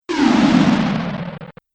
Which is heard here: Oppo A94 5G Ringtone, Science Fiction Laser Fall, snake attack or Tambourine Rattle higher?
Science Fiction Laser Fall